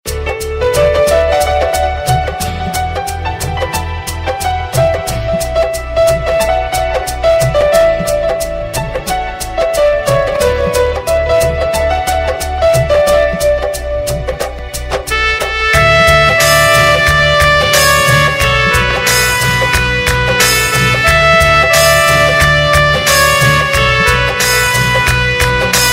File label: Instrumental Ringtone